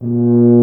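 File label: brass TUBA; Solo Instruments; Miroslav Vitous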